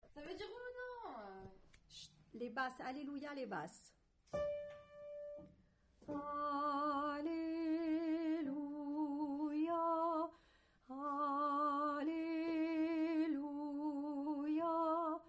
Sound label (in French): Basse